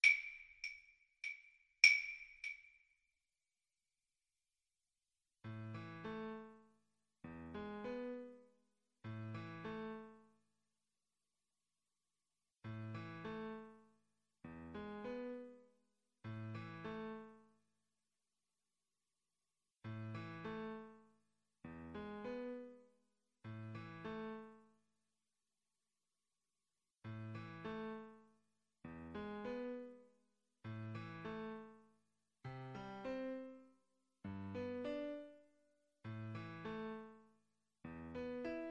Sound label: fur elise, String Quartet